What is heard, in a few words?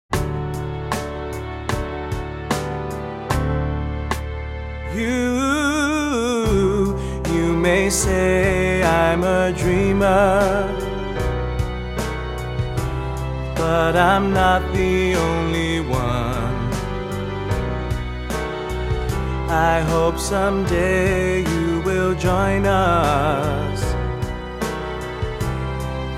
chorus